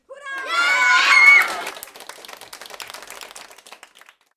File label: Children Joy